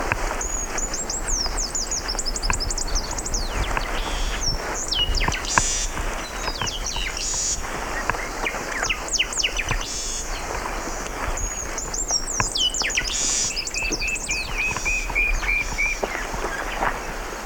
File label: peruvian meadowlark